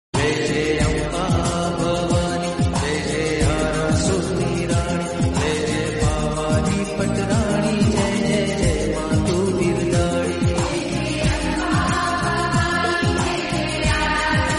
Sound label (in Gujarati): bhakti